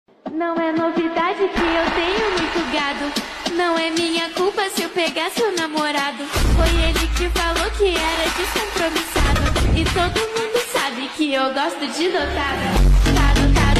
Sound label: phonk ringtone, phonk music; phonk br